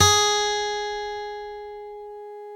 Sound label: GTR G#3 AC; Synclavier Sampler Library; KEYS AND GUITARS